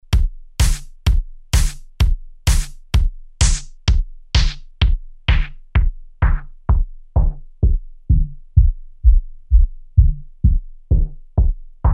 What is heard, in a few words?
filter